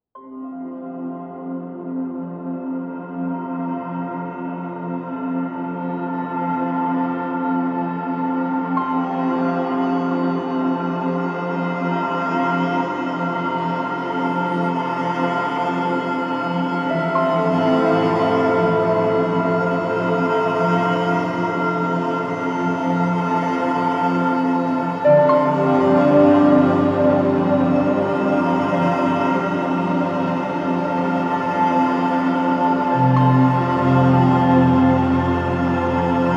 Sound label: Galaxy Harp; Kompose Audio - Galactic Voyager Series: Arcturus Nova; Kontakt